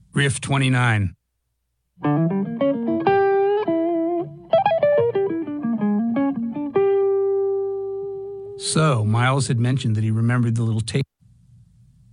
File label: Riff